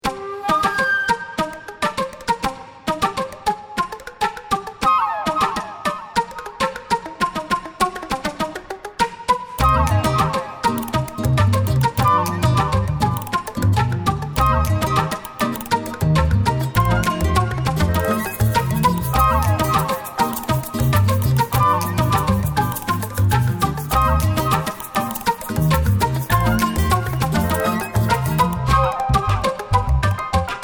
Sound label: flute extended techniques; elastic sounds